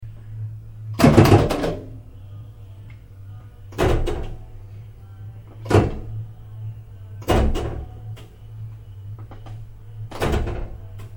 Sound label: mint play